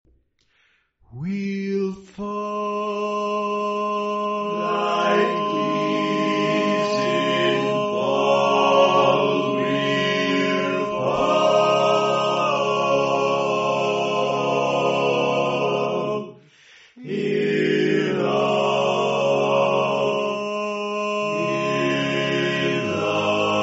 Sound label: All Parts, barbershop